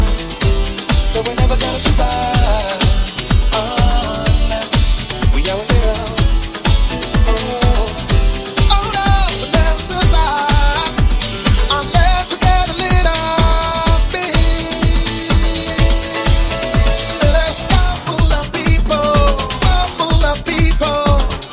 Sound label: Vocal